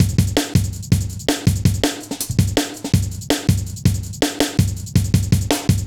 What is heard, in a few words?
Heavy Live B, Drum Loops, Jungle